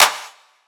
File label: clap steel; Drum One Shots; SD MIDNIGHT Sample Pack; Slate